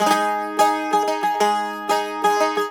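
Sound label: G#, bouzouki; USB Soundscan vol.53; Mediteranean Traditions; AKAI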